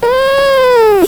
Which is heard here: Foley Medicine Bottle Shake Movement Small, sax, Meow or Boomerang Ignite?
Meow